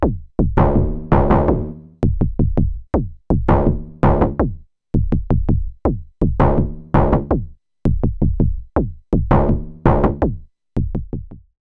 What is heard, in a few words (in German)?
Behringer Syncussion SY-1 Sequenciertes MUTE Decay